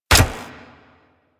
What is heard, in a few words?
Shot, Weapons; Warframe; Infested; Inf Cernos